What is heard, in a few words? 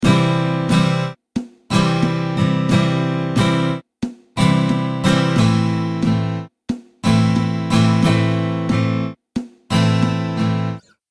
strumming pattern